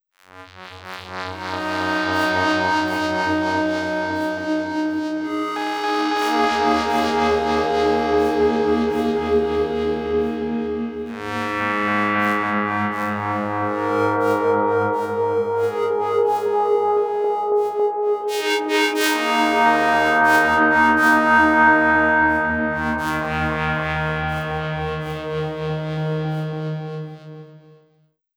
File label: Resonant Pad; Pads